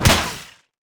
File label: heroes dazzling Decoy explosion; stregi